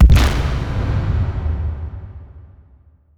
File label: Psionic Trap explode